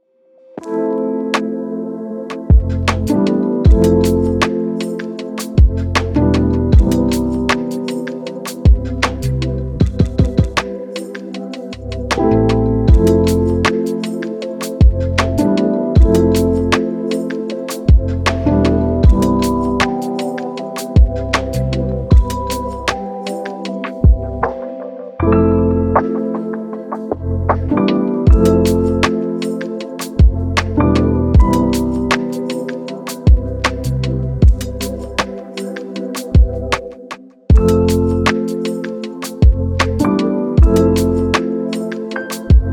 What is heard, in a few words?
relaxing chillhop